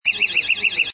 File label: Alarm